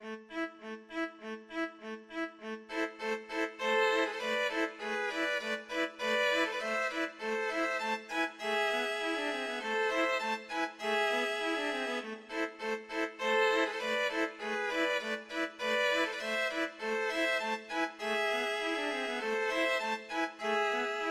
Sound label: violin trio; danses des cygnets